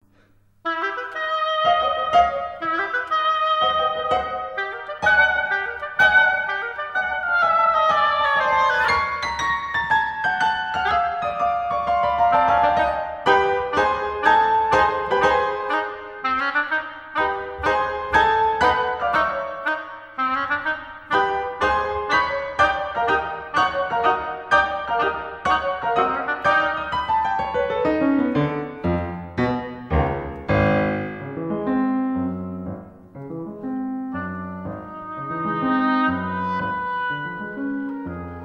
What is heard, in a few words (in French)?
hautbois et piano March Alla Marcia; Temporal variations; Benjamin BRITTEN